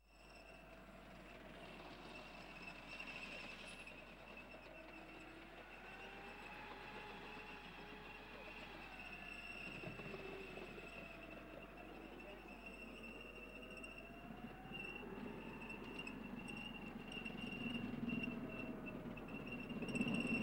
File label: Tank M1 Pass By; KMP Film Video Sound Effects